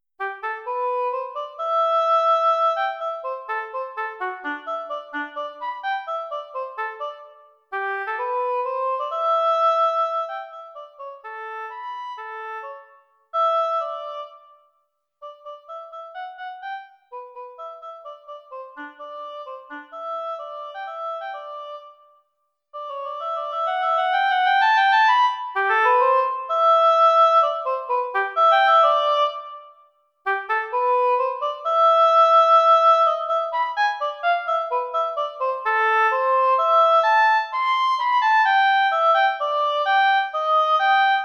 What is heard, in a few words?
Oboe; Band Audition